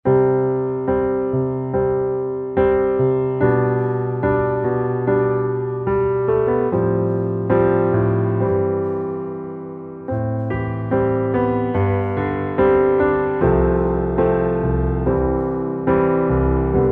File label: Underscore PIANO; Worship Flow